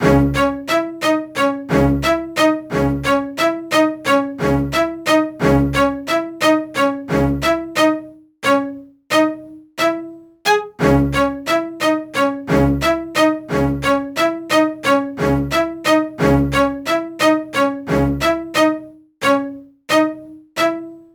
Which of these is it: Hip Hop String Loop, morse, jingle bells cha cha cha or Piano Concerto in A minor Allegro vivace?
Hip Hop String Loop